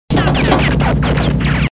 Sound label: Bullets richocheting inside; Airwolf